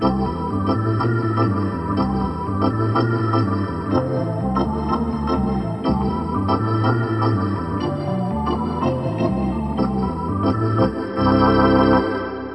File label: Hockey Organ